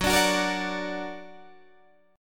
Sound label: F sharp Augmented F sharp Guitar Standard; F#+ strummed; 14,17,16,15,15,14